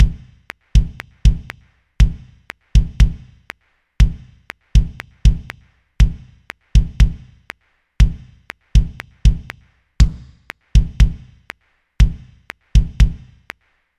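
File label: Classic Rock bass drum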